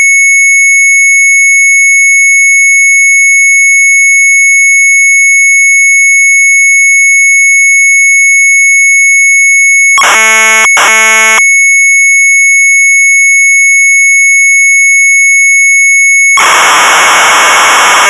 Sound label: MZ800 Tape, sharp; SHARP's MONEY; retromelsarchive